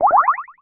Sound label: call; sgh-d720; Digital